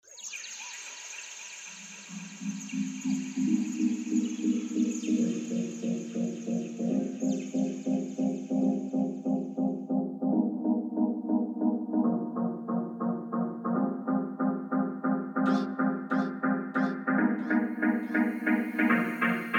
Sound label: synths